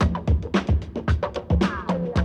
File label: break; Zero G - Man Machine